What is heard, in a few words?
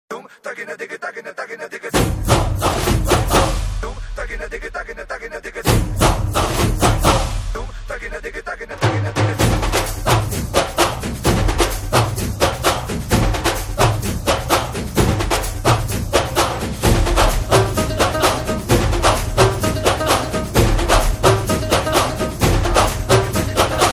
BG Drum Beats Ringtone